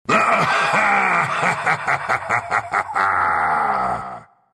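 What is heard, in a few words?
laugh, Russian; Lich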